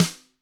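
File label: Gretsch snare drum rim; ILIO; Double Platinum Drums 1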